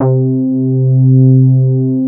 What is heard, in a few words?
SB HORN; Wizoo - Powered Nord; STEREO LAYER